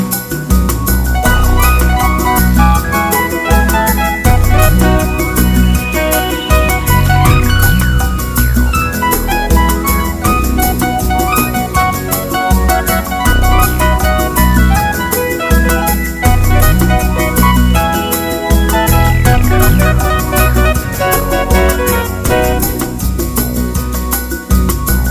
Happy Bossa Nova

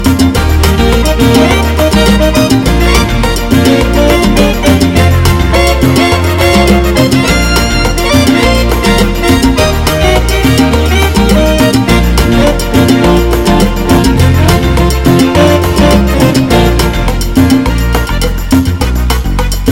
Super Mambo

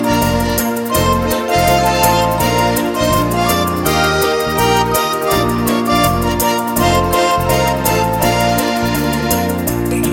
Quick and Smooth

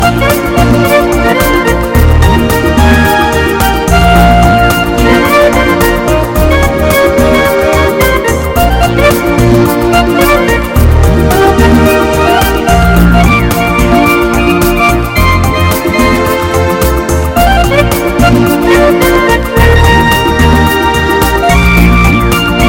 Smooth Super Ballad

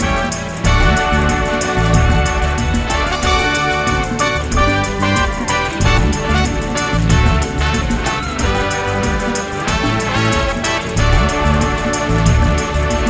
A Hot Shuffle

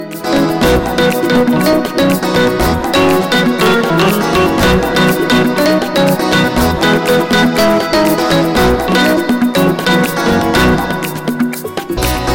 Fast Happy Pop

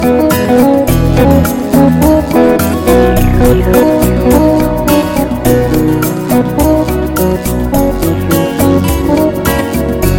Happy and Slow

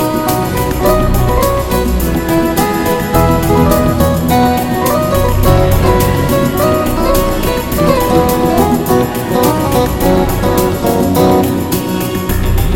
Island Lullaby

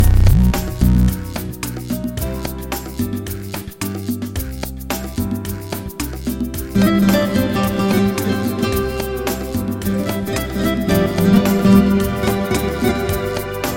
Soft and Beautiful

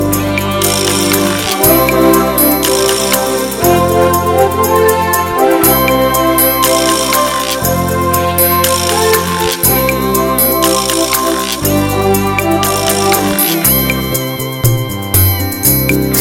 Lovely New Age Music

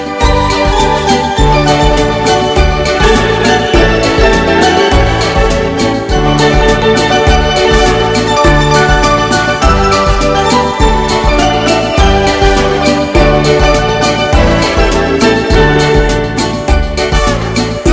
Smooth and Pretty Song

